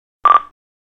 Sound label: Walkie talkie turn on push button beep